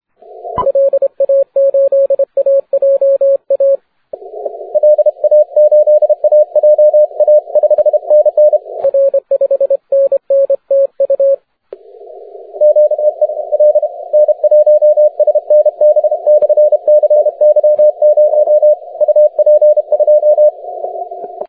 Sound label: 40m cw